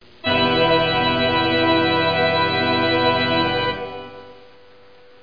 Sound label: ORGAN; Broderbund Print Shop Multimedia Organizer Deluxe Edition